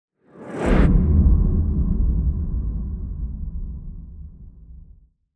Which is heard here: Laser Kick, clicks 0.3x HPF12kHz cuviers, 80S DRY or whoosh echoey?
whoosh echoey